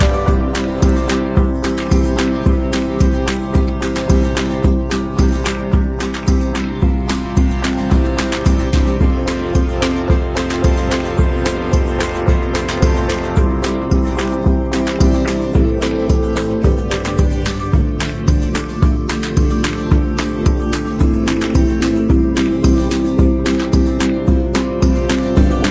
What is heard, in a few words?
bgm